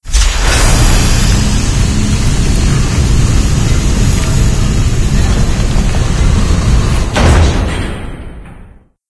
door open close

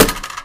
plastic box impact bullet

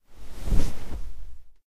sit on couch, foley; video games; source engine; ep2

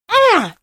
grav charge explo; vo; source engine; episodic; episode 1; citadel

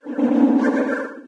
science exclamation, windows sound schemes; windows 9x

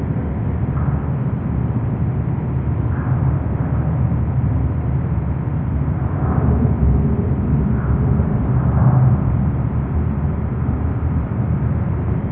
amb industrial medium lp, ambient industrial; video games

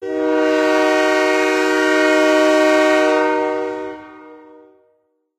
train horn long; video games; source engine; portal2

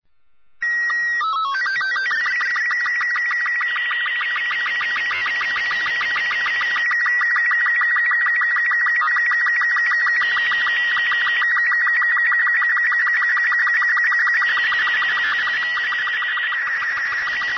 ambient; Portal 2; Source Engine; video games

